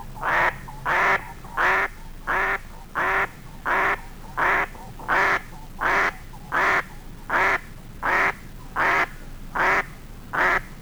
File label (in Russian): podsadnaya krik seleznya